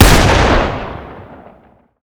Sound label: fire; gmod; arccw uc commons attachments; ub12ga